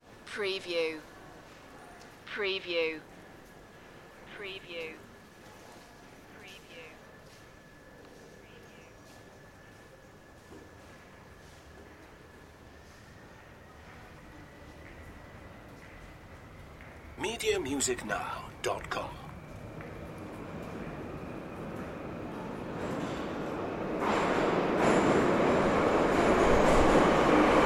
metro trains moscow

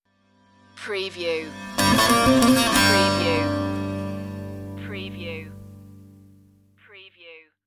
eastern ceremony stinger

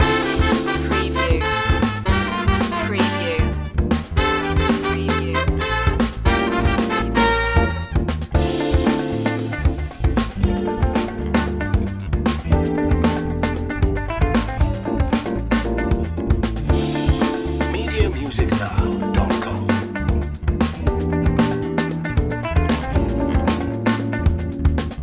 high funk loop

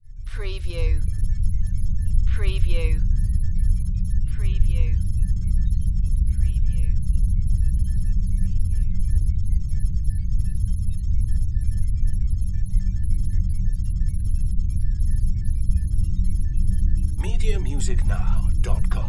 Computer Room Beeps

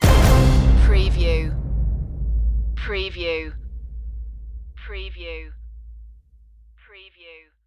mech warrior stinger

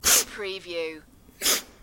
2 sniffs

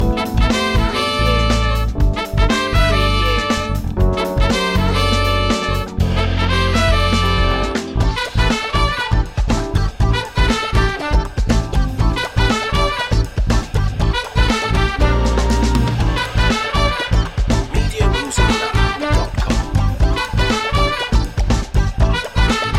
positive vibes loop

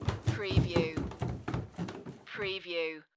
Footstep Stairs Wood

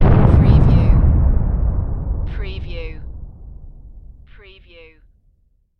Explosion Distant; Sound Effects Bible

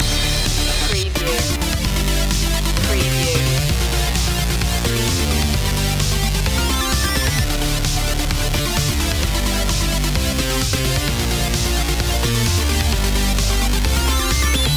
fast beat gamer loop

